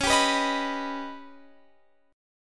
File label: Eb Augmented 7th Db